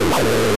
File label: wizard